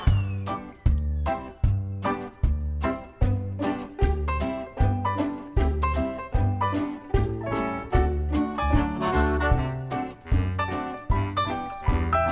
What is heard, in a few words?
Accompaniment; The School Musical 2024/25 - Guys & Dolls Jr.